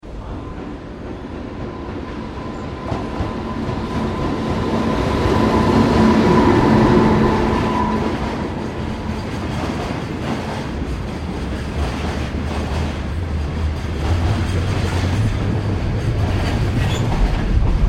Train Aomori E3 Freight Diesal Passing, Japanese Trains